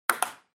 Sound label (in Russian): otbivanie sharika